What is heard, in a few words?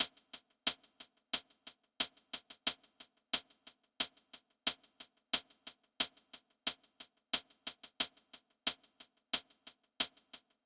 Hihat Loop 90 BPM, Live; Amber